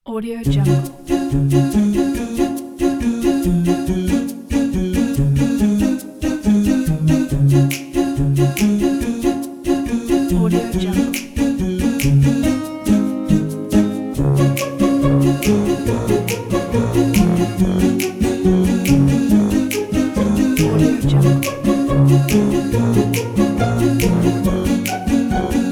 be funny

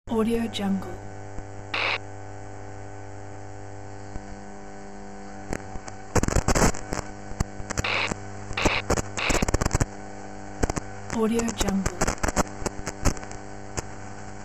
radio frequency interference

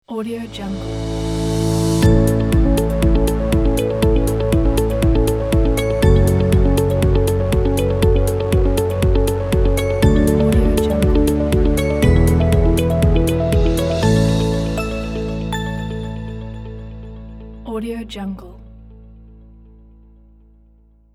upbeat logo corporate

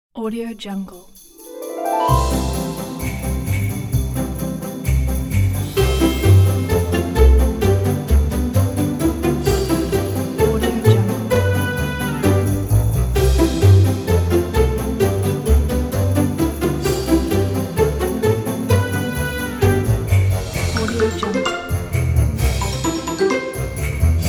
cheerful christmas

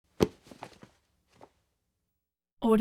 hat take off

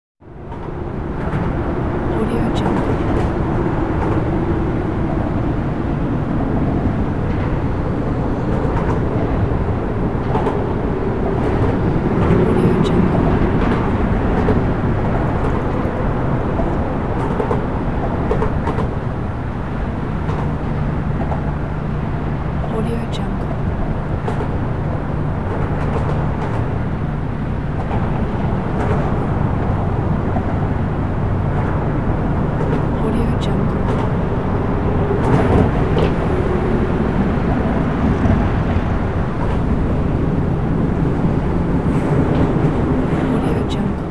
cars passing the bridge

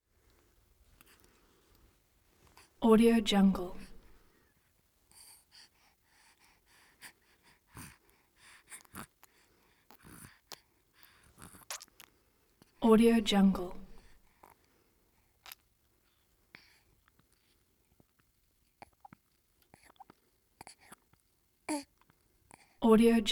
baby cry and suckle male distress

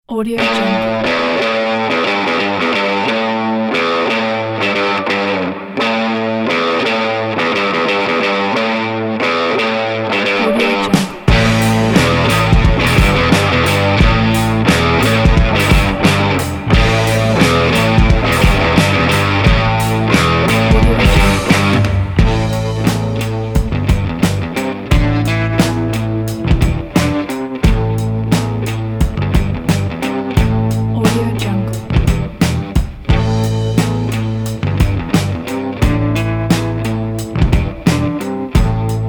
wild gritty indie garage

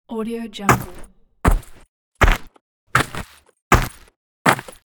footsteps on glass with military plates

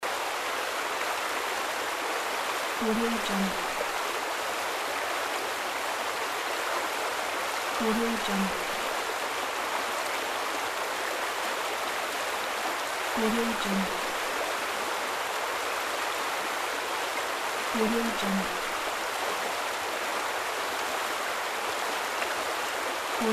river

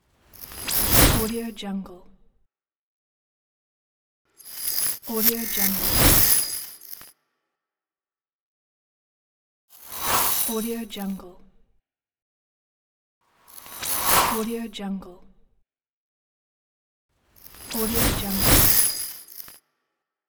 glitch movement and transition; kaelv

